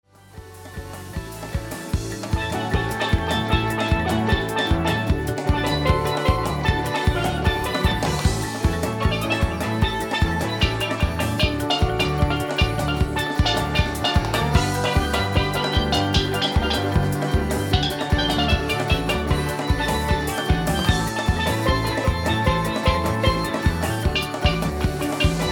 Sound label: Steel Drum